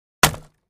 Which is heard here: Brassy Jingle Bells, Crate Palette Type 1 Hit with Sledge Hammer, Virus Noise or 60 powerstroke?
Crate Palette Type 1 Hit with Sledge Hammer